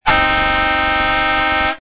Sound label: siren